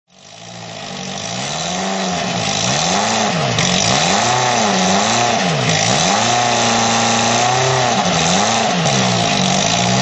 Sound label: mg q type